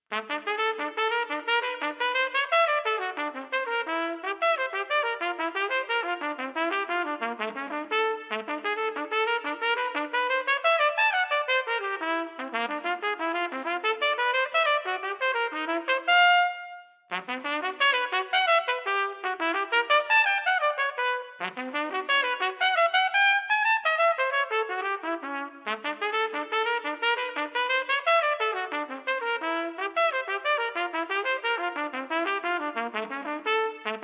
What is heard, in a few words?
Trumpet Skills